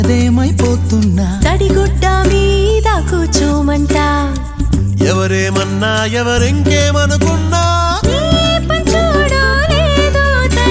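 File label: Telugu Ringtone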